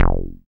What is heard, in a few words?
Bass SH-101